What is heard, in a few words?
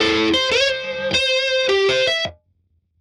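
Gliding Piano; соло; Guit Solo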